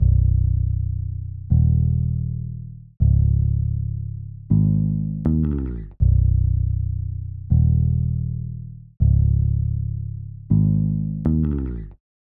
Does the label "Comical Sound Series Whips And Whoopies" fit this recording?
No